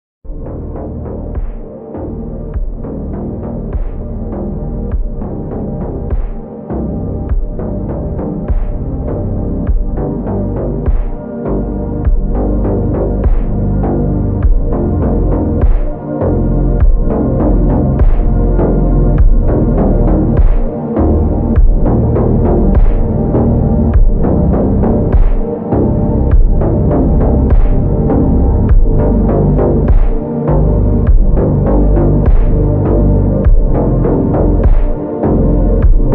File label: ultra slowed muffled